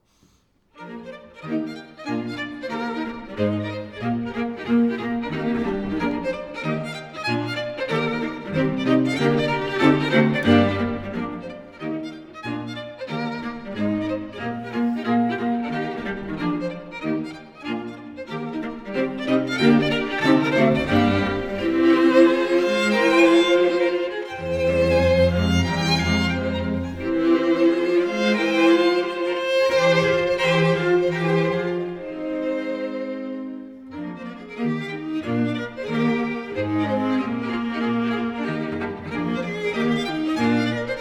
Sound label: String Quintet; Quintet for strings in E flat-major op. 97; Dvorak; 4th mov; Festival Montalcino 2008